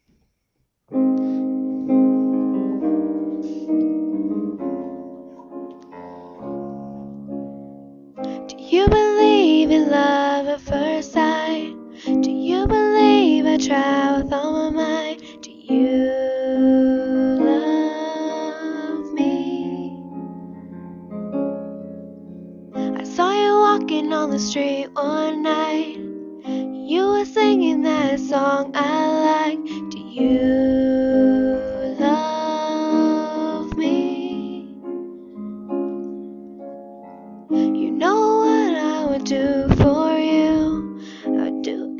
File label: singing